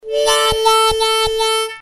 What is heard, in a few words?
whah